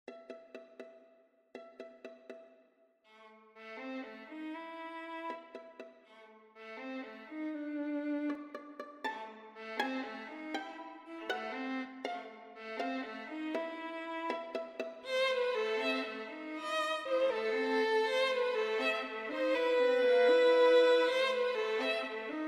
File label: Violin